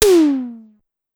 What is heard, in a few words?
Electro Tom 1 Hi, Drum Machines, Clavia DDrum AT, Pitched Up; 300 Drum Machines